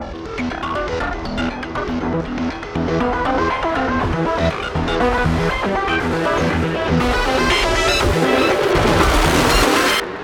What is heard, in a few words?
10 sec Countdown, Music; Scraprun